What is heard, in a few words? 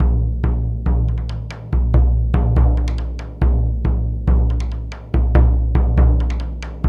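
140 bpm, Asian Daiko Rolls; Spectrasonics - Supreme Beats - World Dance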